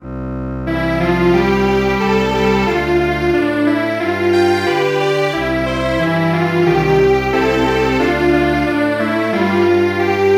Strings Quartet, traditional; El virolet

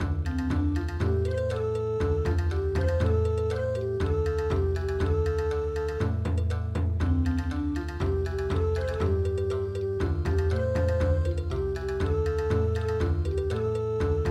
nyanya torajo bon

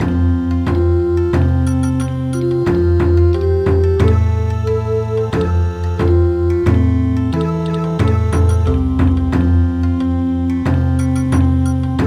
Bon Odori, midi; traditional

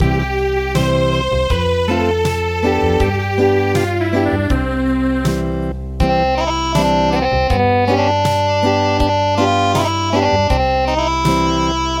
Acoustic

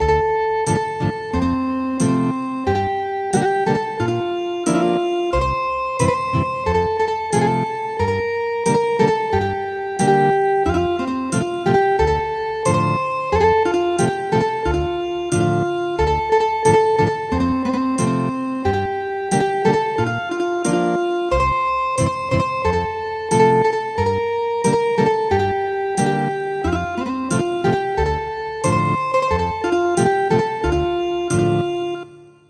Ethnic Guitar, traditional

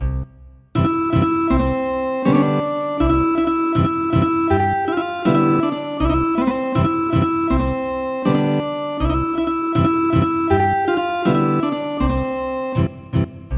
Ethnic Guitar

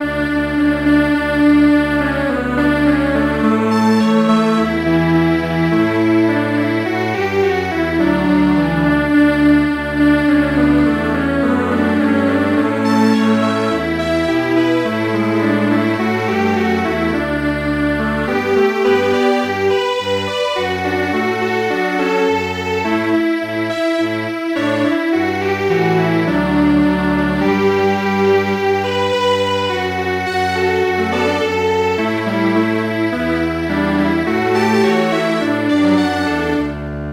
Strings Quartet; Anak kambing saya

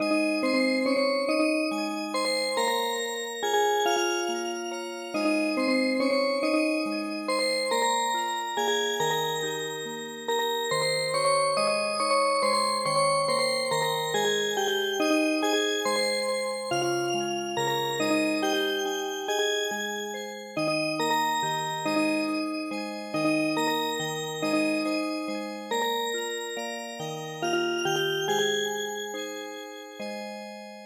Music Box

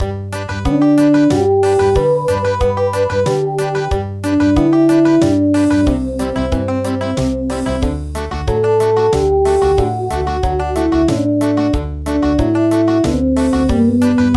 Country

